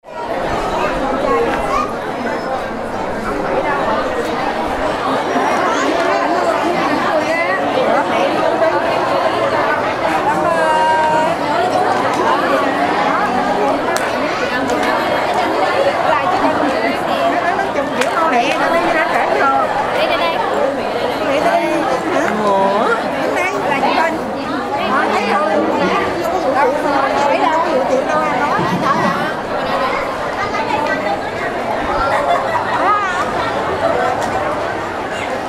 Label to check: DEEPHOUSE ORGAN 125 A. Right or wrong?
Wrong